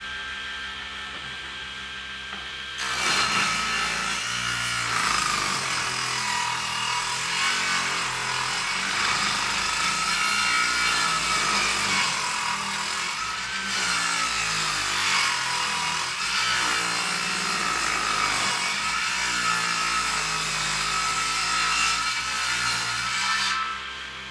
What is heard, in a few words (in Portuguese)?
Serra mais forte e continua com grande pausa no meio em ambiente interno; maquinas; com pessoas; Colecao Sonora do Cerrado